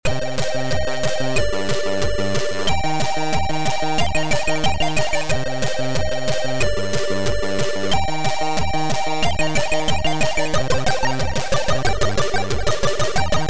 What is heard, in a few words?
Game Over; Capry Opens